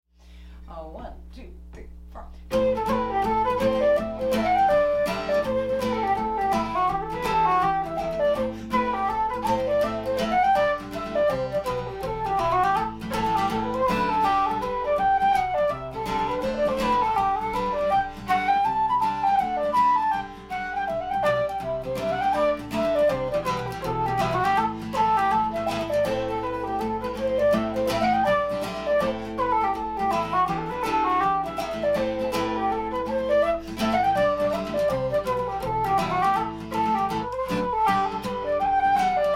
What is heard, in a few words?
Flute and guitar